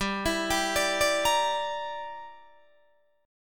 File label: G Minor 6th, Guitar Open D